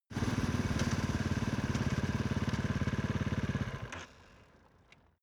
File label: motorcycle sport bike accelerate idle stop